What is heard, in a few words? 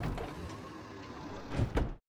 F-5E canopy close, aircraft; DCS